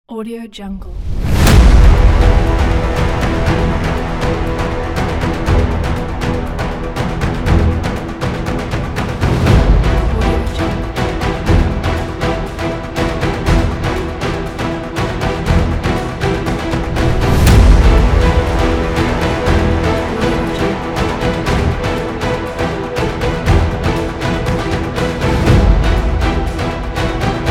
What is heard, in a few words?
epic action chase; orchestralis